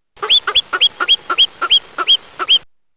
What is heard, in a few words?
marmot